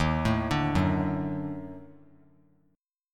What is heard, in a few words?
D sharp Minor Major 7th Flat 5th D sharp; arpeggiated